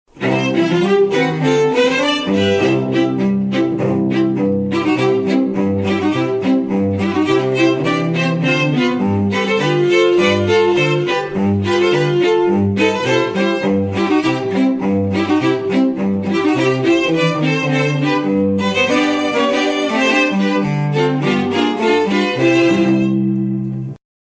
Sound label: Beer Polka, Strings